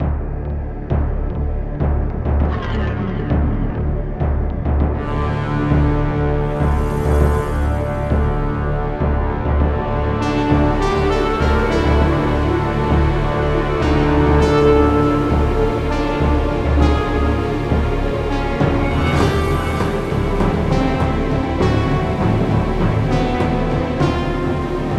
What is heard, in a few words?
defeat